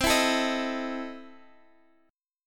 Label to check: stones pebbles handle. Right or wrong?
Wrong